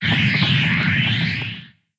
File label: Fever Loop Fire; blue archive